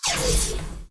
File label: bullet generic titan shield scatter; Titanfall 2